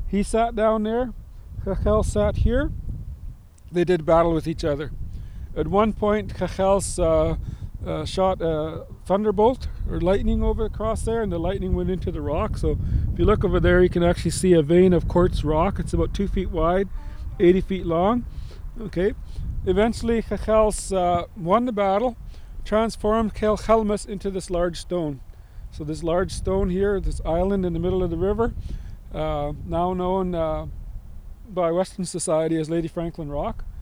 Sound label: Lightning Bolt